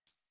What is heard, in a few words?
Computer Room Beep Misc